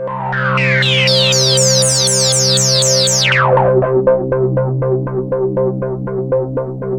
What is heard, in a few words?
ANALOG PULSER, OBI FX; Zero-G Ambient 1